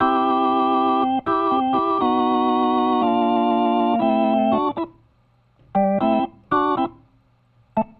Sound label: Organ, 70s Analog Funk